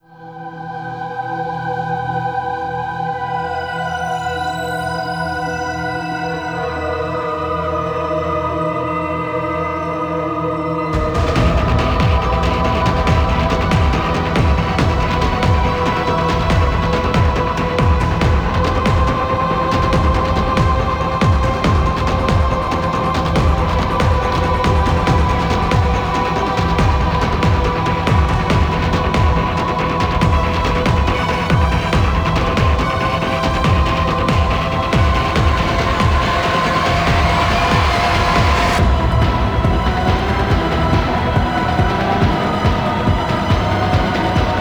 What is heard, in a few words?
Good Ending Cue; gameplay; Warframe; Nineteen Ninety Nine; Stage 11 Future Past